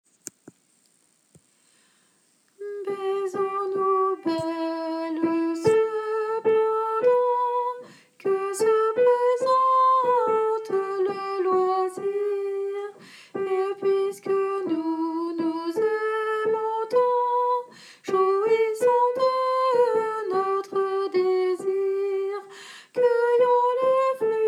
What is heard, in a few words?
soprano; baisons nous belle; Adrian Leroy; Second livre de chansons Leroy & Ballard1564